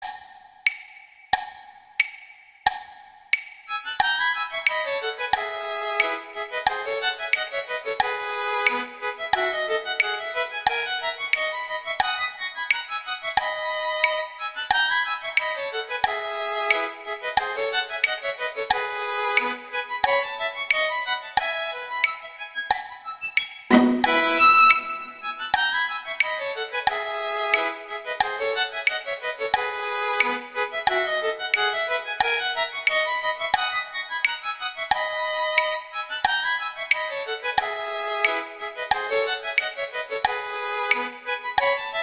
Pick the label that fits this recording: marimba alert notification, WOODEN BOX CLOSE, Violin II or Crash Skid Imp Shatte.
Violin II